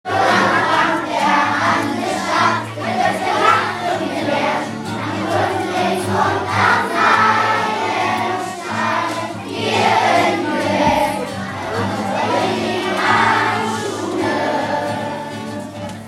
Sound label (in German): Refrain Song Grundschule; WBS Song